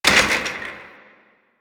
Office Chair Impact; Warframe; Nineteen Ninety Nine

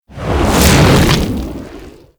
Infested Bone Blade Melee Swing; Warframe

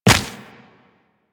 Run Dirt, Footsteps; Warframe; Tenno

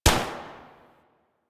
Fire Shot, Weapons; Warframe; Tenno AR

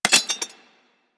Rifle Mag Drop; Weapons; Warframe; Thanotech

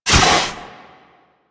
Fire Extinguisher Start; Rail Jack

